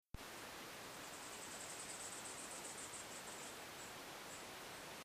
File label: Dendroica angelae Maricao State Forest Puerto Rico; West Indian